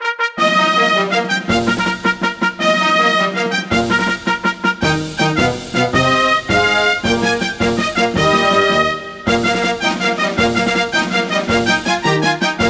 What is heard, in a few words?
fanfare